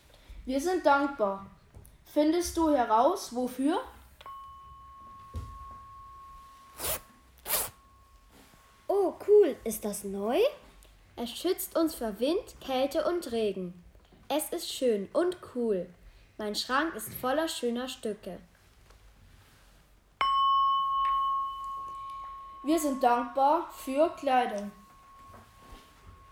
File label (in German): Akkordeon; Hörrätsel Erntedank